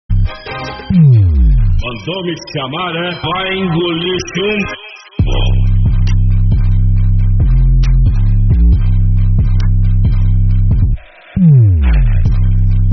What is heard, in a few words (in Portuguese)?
musica para testar grave bass boosted